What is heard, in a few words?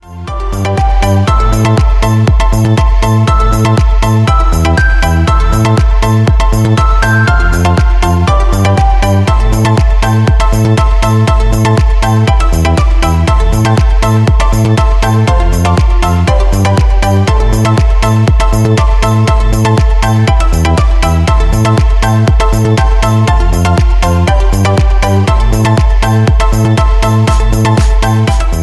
Groovy Beats; MIUI14 and HyperOS 1.0